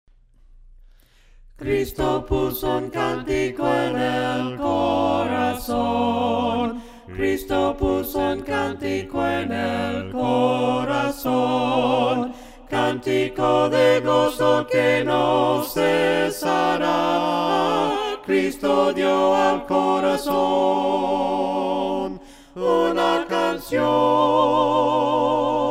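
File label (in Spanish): CRISTO PUSO UN CANTICO VOCES, himnos a capella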